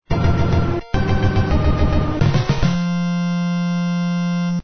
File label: win; contra; svencoop